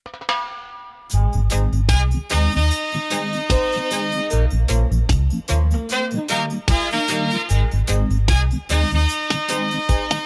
Reggae Beach

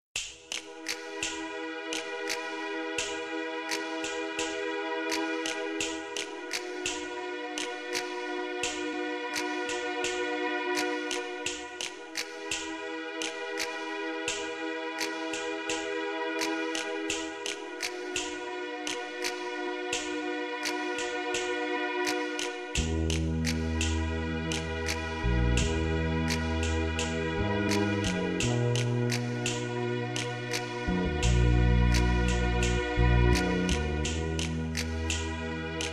spa music 432 Hz; gleboki oddech; Głęboki oddech

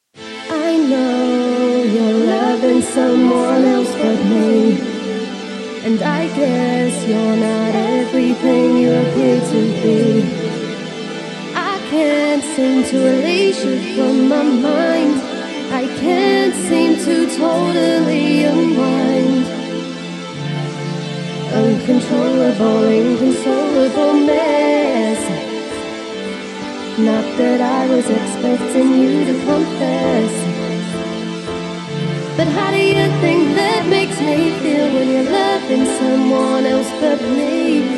disco music 432 HZ

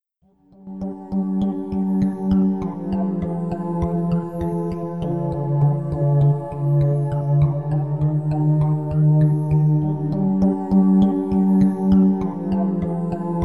spa music, 432 hz

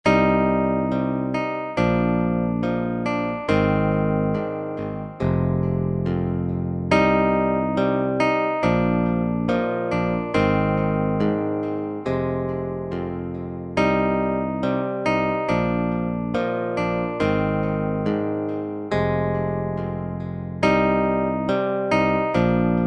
chill beats 70; on the Dance Floor

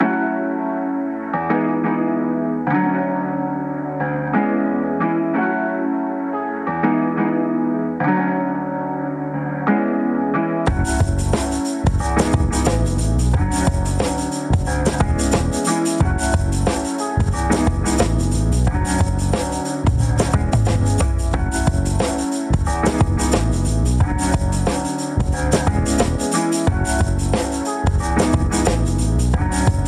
Rhythmical Funk Vibes

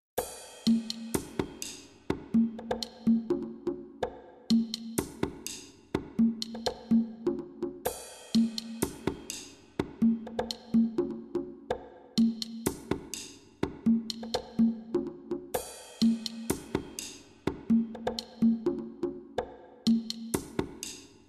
om mani padme hum instrumentalna, 432 Hz

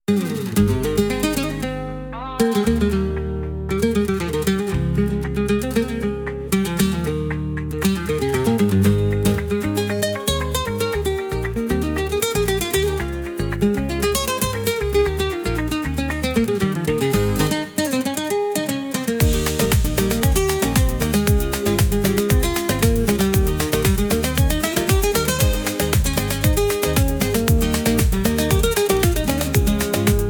flaming strings

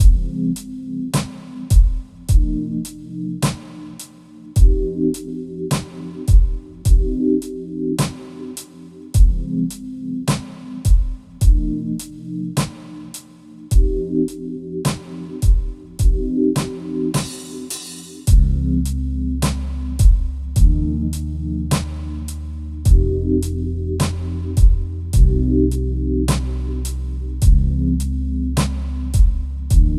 chilling funk 432 hz

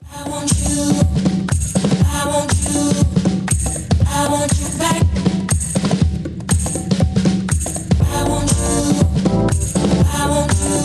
Chillout Funk Fusion

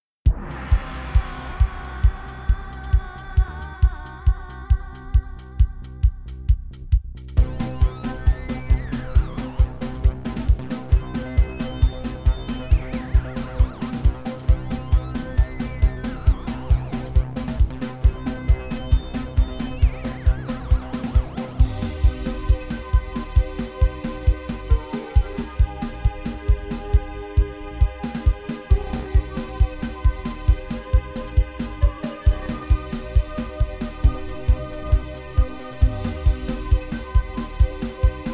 fitness music 432 Hz; 135 BPM

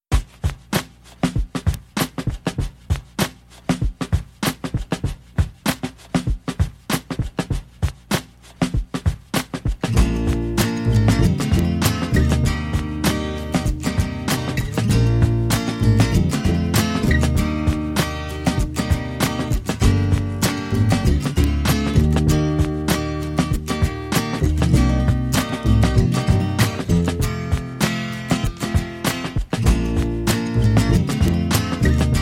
acoustic vibes; 432 hz

